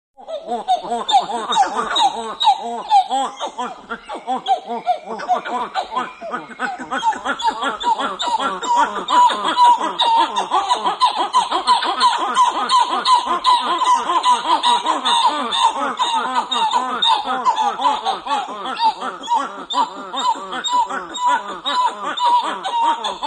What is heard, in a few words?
Monkey Titi Calls, titi